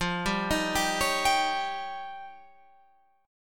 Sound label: F 7th Suspended 2nd Sharp 5th, Guitar Open F; arpeggiated; chord; F7sus2#5; 17,14,15,14,16,14; JGuitar